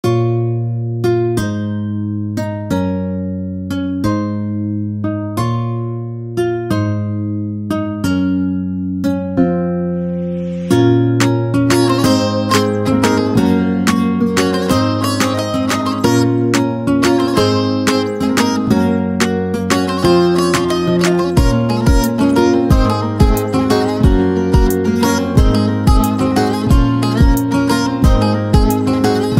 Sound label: saz summer trap